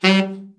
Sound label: BRA TENOR SN, SAX; Best Service Gigapack 1; BR SAX 4